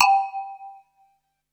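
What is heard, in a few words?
PERC 2 G4, percussion; SEQ AND PERC; Music Store - Claudius Diemeter Schwerlos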